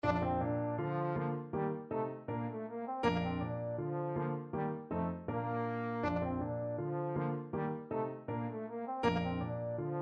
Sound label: bach gavotte 2 bwv1012, trombone